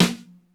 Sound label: DRY S, drum; Crypton - DrumBase5000; DRY KITS